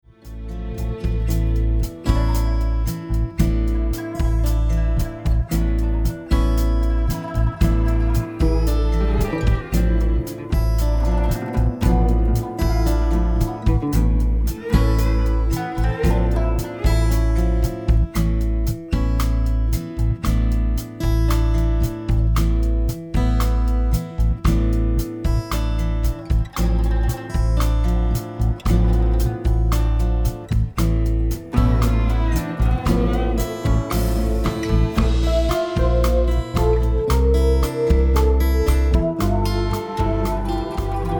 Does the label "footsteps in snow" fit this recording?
No